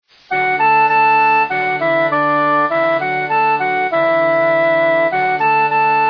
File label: English Horn; Hovis